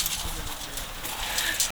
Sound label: creak breath, 140; movement on gravel